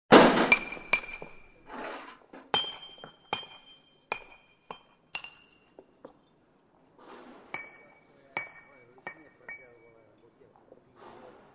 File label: Workers Mending Cobbled Street; Hollywood Edge Historical Series; Traffic Free BG's & FX